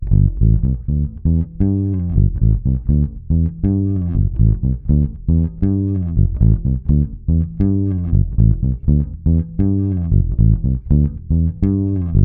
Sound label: Refpunk Bassline